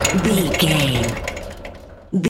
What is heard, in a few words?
metal rattle spin med